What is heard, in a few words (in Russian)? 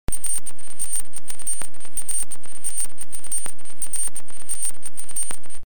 sensor glitch skrin